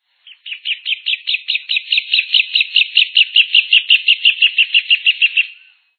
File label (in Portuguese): casaca-de-couro-amarelo